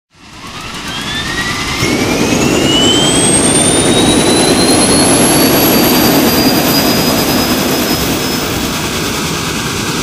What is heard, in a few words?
engine tvd start; War Thunder